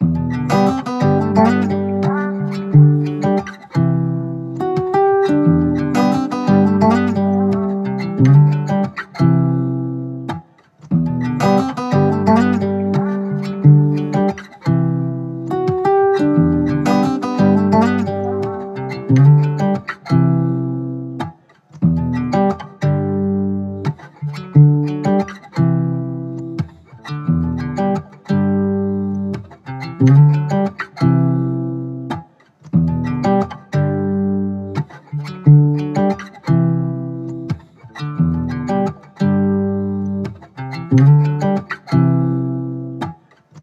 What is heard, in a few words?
88 BPM G Maj, Guitar Loops; Want Me; Cymatics; Journey Guitar Loops